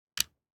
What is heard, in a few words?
DICE on Felt, Grab, Standard, Three Dice; Samples; ArticulatedSounds; DICE Sfx Party (Extended)